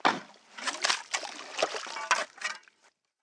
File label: clay; PHARAOH